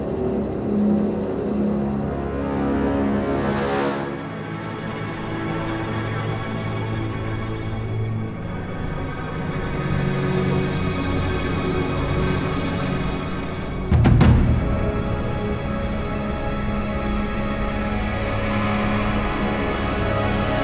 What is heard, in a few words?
Realization Cinematic Cue, Music; Deora; Fable Of Garren Rood